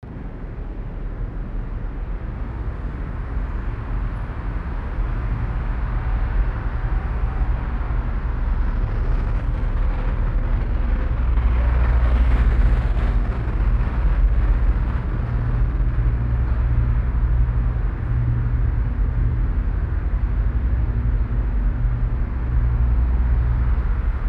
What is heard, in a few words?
contact noise feedback convolution; Allston; MIT; Providence